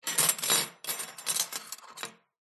glitched tones household dining room tile table cutlery pick up clatter